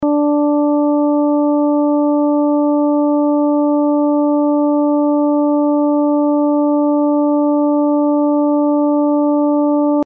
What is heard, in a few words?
293.33Hz